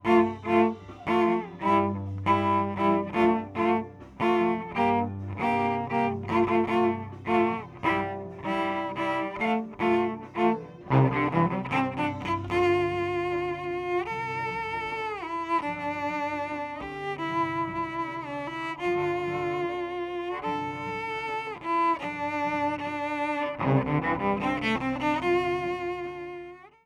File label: Cello DI No Tone